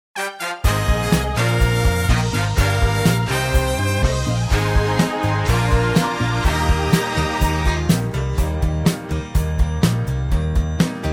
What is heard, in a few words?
Eb To E No Backing Vocals